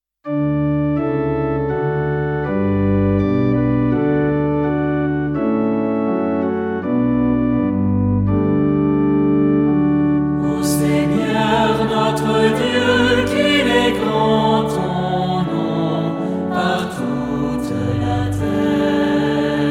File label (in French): Psaume, Ô Seigneur notre Dieu qu'il est grand; Ps. 008; Ste Trinité C